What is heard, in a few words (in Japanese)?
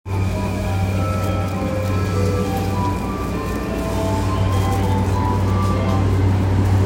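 山手線大塚 counterclockwise, train sounds; JR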